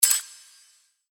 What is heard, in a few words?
click